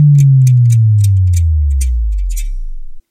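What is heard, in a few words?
time stops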